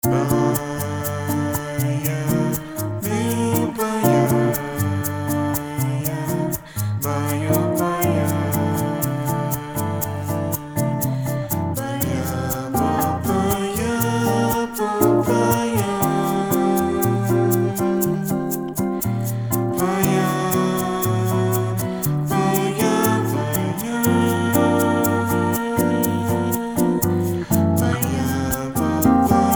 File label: JAZZ ROMANTIC COMEDY guitar shaker